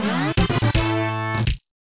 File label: Victory!; Dragon Ball Z RPG; Legend of the Super Saiya-jin; SNES